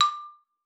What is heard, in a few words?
D5, xylophone; Xsample VOL-4